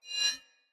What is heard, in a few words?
Spear Whoosh Accent; Weapons; Cleansed Spear